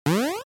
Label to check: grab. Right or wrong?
Right